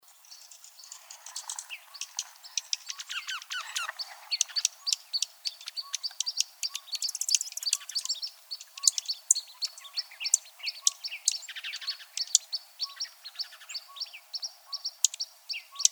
CALLS, Emberizids; deviche

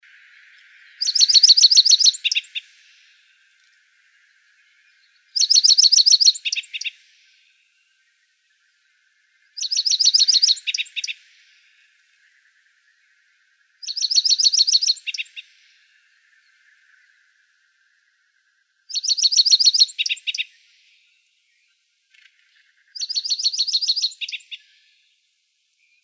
Emberizids; YEJU; deviche

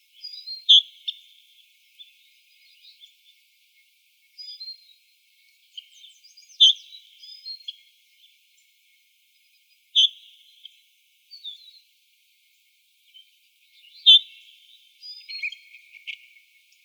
calls, Icterids; HOOR; deviche

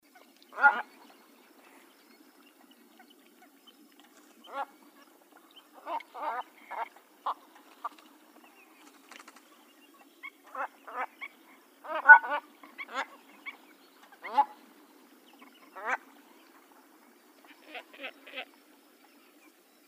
Large Wading Birds; WFIB

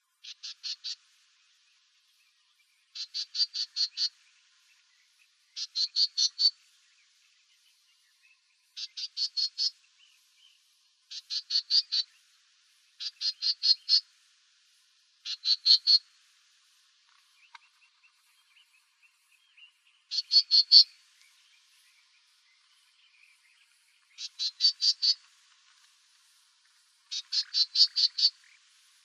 Wrens Kinglets Gnatcatchers; BTGN; deviche

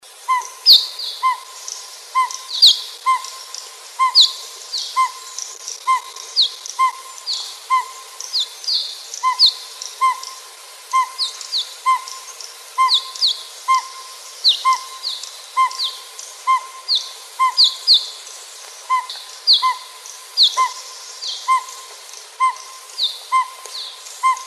Owls; NOPO

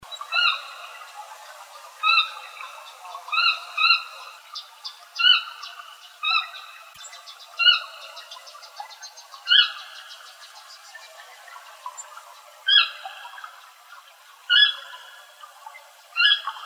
Sora calls, Rails and Allies

